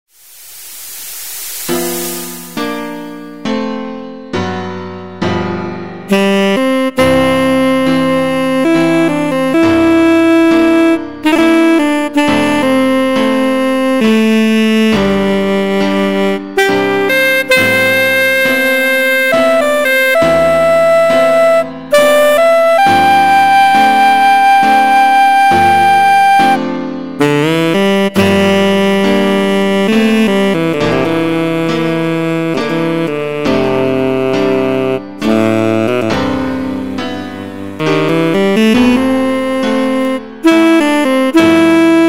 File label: Sax Lab